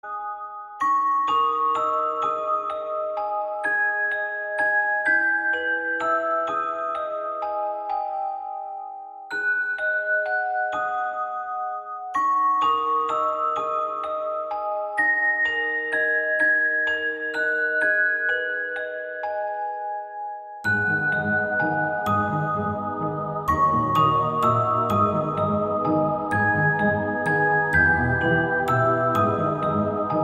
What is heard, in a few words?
Crystal Chimes Ringtone